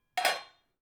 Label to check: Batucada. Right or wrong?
Wrong